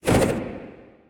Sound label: basic spin; Smite; Cabrakan